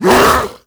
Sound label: attack grunt, zombie; creatures